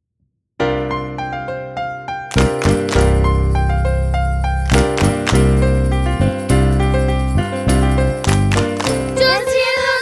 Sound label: bounce, choir